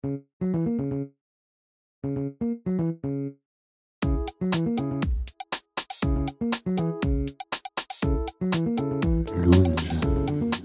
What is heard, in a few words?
smooth lounge